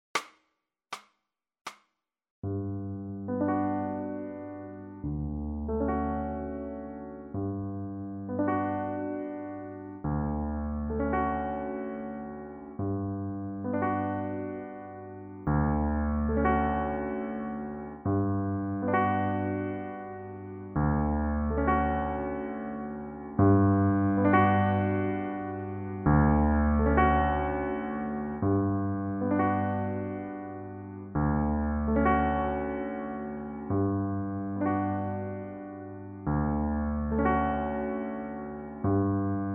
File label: piano solo